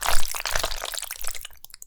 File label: oil footstep; dfcaverns